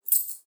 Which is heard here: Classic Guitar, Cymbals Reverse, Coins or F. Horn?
Coins